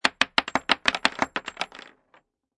Bullet Shell Drop Machine Gun Multiple Solid Wood; Multiple bullet shells drop: Machine gun multiple empty shells solid wood.

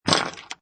Pinball Sound Ball Sprung; Video Games

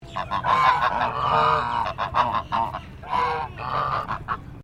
BIRD GEESE CHINESE HONK; Birds from Collingwood Children's Farm; Animals

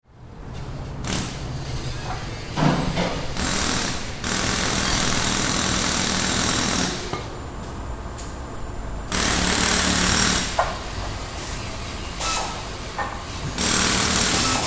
Ambience Auto Repair Shop; Auto Shops

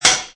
Metal Plate ding; Metallic; Multimedia; Lynne Music

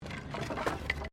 Wood Move; Material components for constructing more complex sounds; Wood (Sound Effects:Materials)

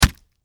Impact Punch Body Hard Wet; Punches and Hits; Combat

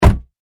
transportation car door shut external; Car Door Shuting - External Recording; Cars; Vehicles; Car Door Shutting

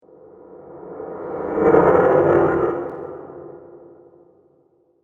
Taken By Terror, scary soundscape; horror

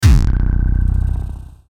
Hit Low Buzz, Hit Low Buzz Swell; Production Element Imaging Element Accent Transition; Bass Button; Bass Buttons; Blastwave

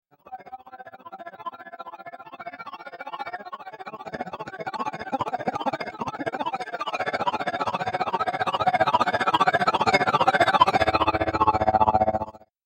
Robot SciFi Arpeggio Ascending Intense Build

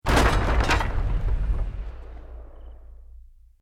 EXPLOSION MEDIUM WOOD; Created explosion effects, house, building or vehicle effects; Bombs; Bomb Sound Effects

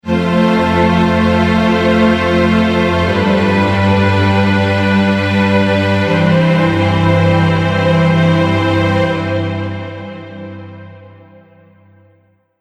Epic Opening Scene Strings; Musical Sound Effects

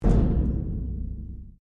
Strike Metal Hollow Tank Soft; Materials

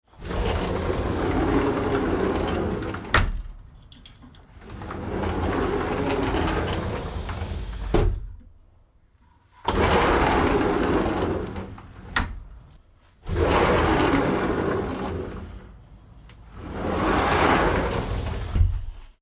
Sliding Door

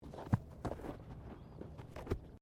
Body movement Foot Foley; Body movement foley to simulate falls and deaths; Footsteps; Human

